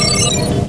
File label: BEEP